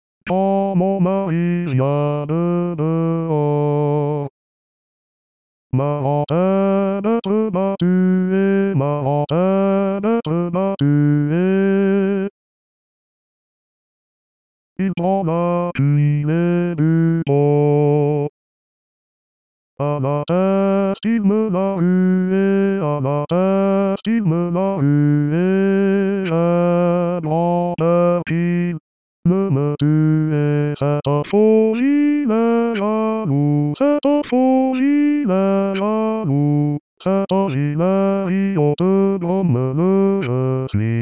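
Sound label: bass; di Lasso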